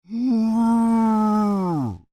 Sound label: Creature, Human, Moan, Med